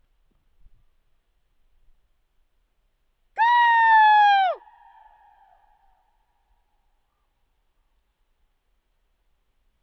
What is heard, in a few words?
Hawk Call